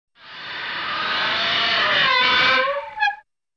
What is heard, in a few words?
metal, gnissel